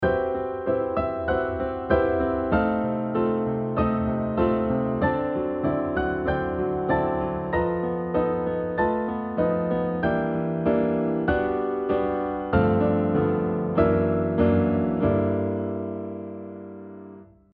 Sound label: polymodal c lydian + c dorian